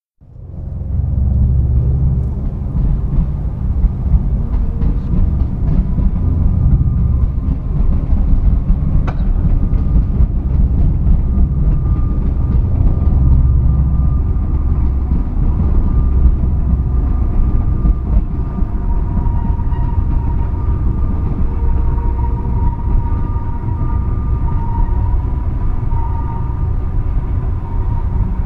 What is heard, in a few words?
merri plane train; Provenance 2007